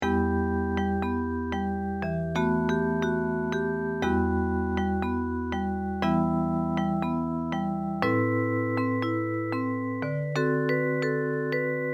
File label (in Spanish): tango sencillo